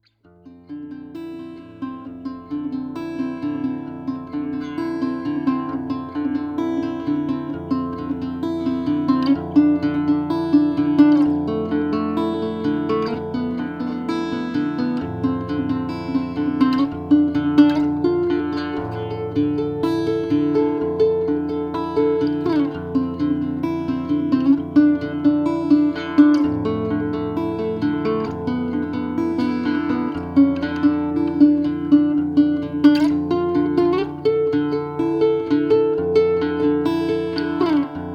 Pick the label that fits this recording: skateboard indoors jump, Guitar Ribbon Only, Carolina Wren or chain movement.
Guitar Ribbon Only